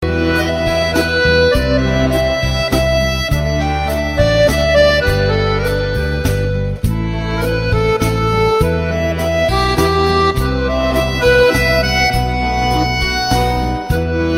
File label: Soft Accordion